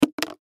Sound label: Lens Cleaner Drop, Foley Lens Cleaner Drop Liquid Slosh Plastic Bottle; Drops; Objects; Blastwave